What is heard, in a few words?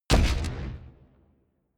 flutter echo